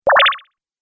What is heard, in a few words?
Laser Switch Button